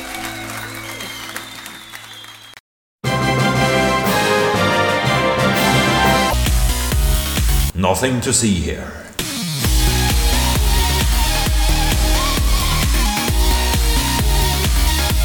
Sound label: Victory Fanfare Short